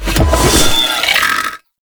Dive Bomb Attack; Enemies; Abilities; Warframe; Sentient; Brachiolyst

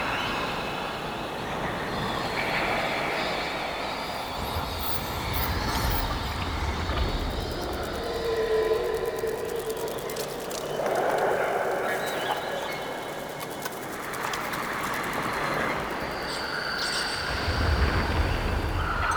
Exterior Hub Loop, Ambience Infested; Warframe; Infested Eris; Amb Loops Exterior

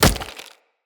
Wood Flesh Impact; Weapon Impacts

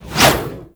Scythe Whoosh; Reaper

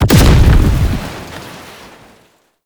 explosion; Warframe; The Teacher; Mod Quest; Dojo

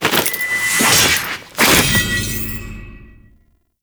riposte finisher foley; Enemies; Drifter; Warframe; Duviri; Dax

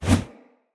Player Torch Whoosh; Weapons; Blunt

